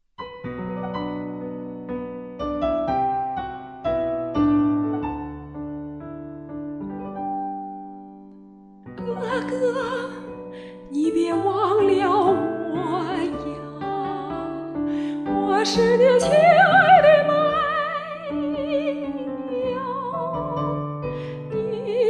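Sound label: vocal noise breath; mei niang qu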